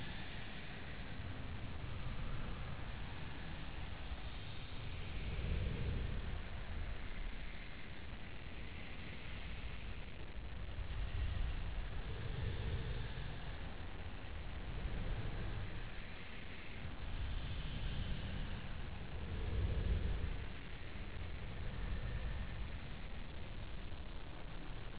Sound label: echoes, ambience; nzp